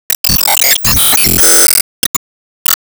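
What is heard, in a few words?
System Skill Levelup; Disney Star Smash; Android, iOS, Mobile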